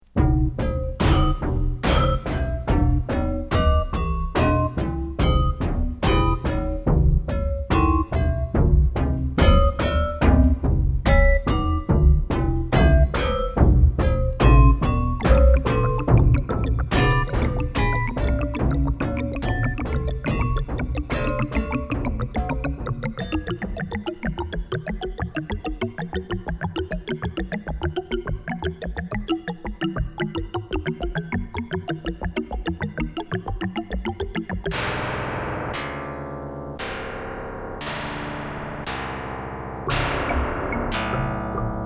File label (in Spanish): musica electroacustica espanola